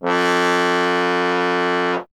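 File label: bass trombone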